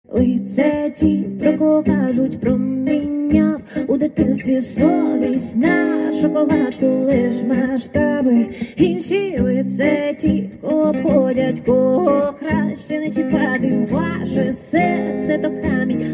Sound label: acoustic